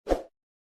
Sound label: swoosh